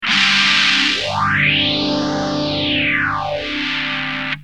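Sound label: guitar boss ph1r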